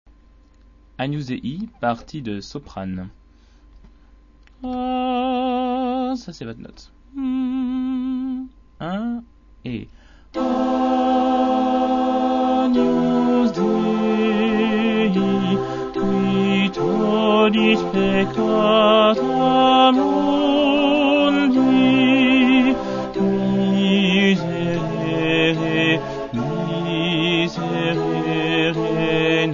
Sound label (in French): Messe Monteverdi Agnus sopranes